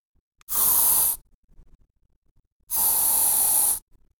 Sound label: poison spray